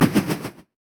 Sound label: axe throw; olaf